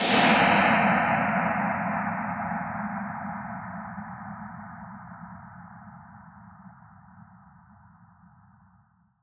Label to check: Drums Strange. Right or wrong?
Wrong